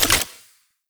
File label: Shotgun Reload; Pax Duviricus